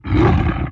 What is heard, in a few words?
leopard